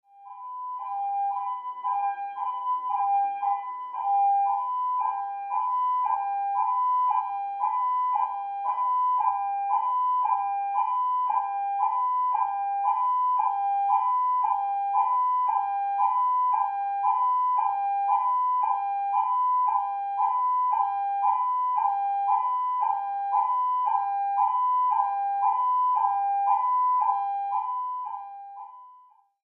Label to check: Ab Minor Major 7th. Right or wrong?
Wrong